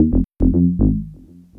Acid Squelch, Bass; Sonic Foundry (Sony Creative Software); Synchro Funk

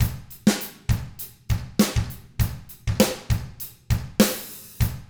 ROCK, Drums; ILIO; Double Platinum Drums 1

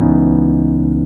EP CHORD; E-MU Formula 4000 Series Vol. 1; Hip Hop Nation; Hip Hop Kit 2

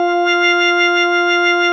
ORG Vox F, Continental Vox Wah Bar; Roland LCDP09 Keys of the 60s and 70s 1

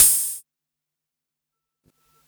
Quasimidi 309, Drums

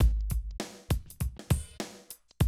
drum loop, 100 BPM

